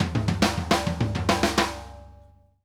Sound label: ALL ARND, drum fills; Sampleheads - New York City Drumworks VOL-1